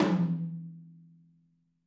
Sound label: Drums Percussion, Kit1 Room Mic